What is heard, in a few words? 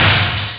sdrum; frightnight; Amiga Soundtracker Sample Packs